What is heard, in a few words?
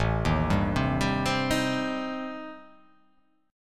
A-Minor 7th Flat 5th-A-down, chord; Am7b5 arpeggiated; JGuitar